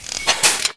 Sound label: mgsj cool off end